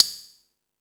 Percussion FX, E-MU Pro-Cussion

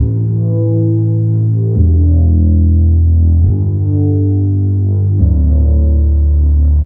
Spacepad Bass, Drum N Bass; Sonic Foundry (Sony Creative Software); Knutrix Drum & Bass

